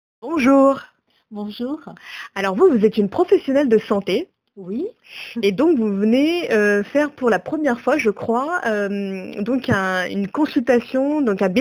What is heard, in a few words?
Vocal